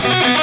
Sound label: SMS